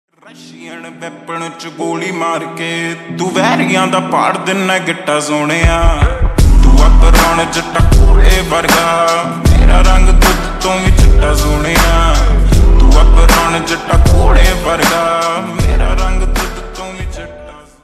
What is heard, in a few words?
Slowed Reverb Ringtone